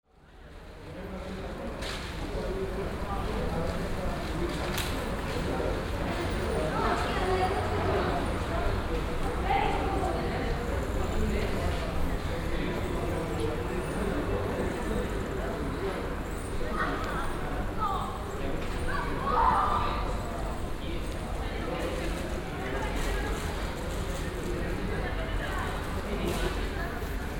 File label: barcelona carrer rogent balcony